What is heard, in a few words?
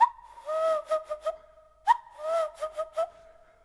Bird Whistle; African; SFX; Quazibeat; African Secret